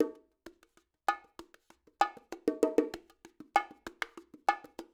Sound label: Bongo, acoustic percussion loop; Drums & Percussion; Percussion Loops